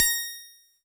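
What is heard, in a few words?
Harpsichord DX100 A sharp 5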